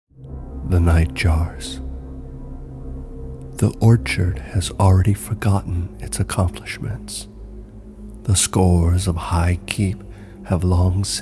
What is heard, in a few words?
normal vox version